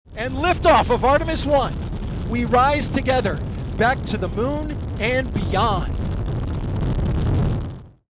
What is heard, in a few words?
liftoff